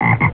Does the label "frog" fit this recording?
Yes